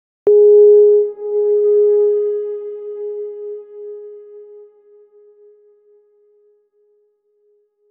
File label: soft sob